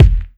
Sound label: warm sounding bass drum one shot f key, Royality free kick drum tuned to the F note